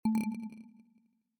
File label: tone